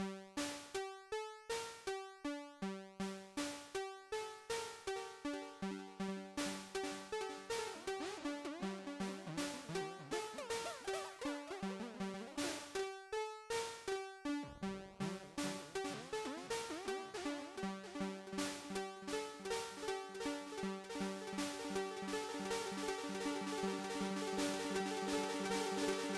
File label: delay